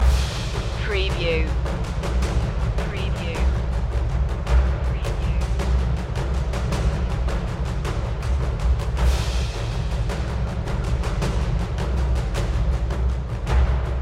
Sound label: perc loop